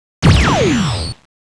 Coin; Raiden III

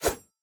Melee; Boomerang Fu

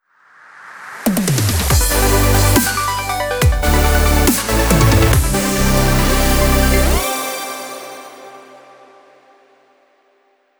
Call [VICTORY]; Lord of Vermilion IV Original Soundtrack

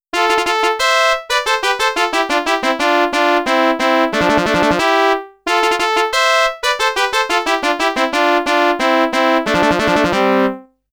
Game Over Guillaume Tell Overture, PC-8801mkIISR; Technosoft Music Collection Thunder Force I II 2022

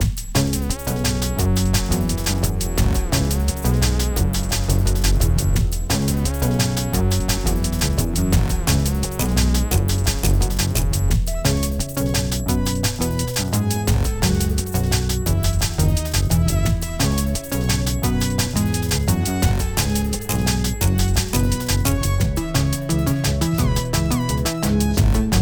Breakbeat; minecraft story mode gamerip 2016; Assembly Required; Redstonia Credits

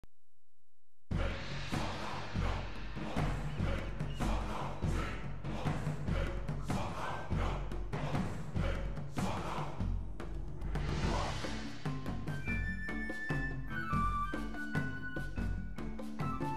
Tribal Theme; Celestia

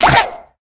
Pigs Potion Split; Angry Birds

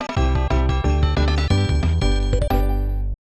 Stage Clear (Christmas); Donkey Kong Country 3; SNES

